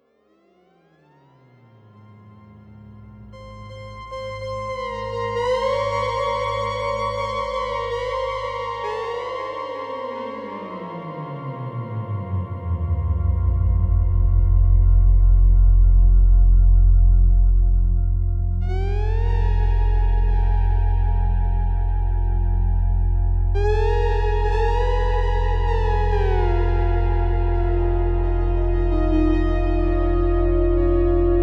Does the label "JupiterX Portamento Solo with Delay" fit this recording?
Yes